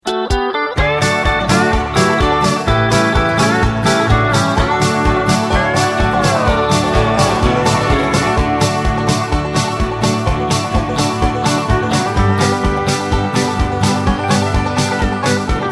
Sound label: harmony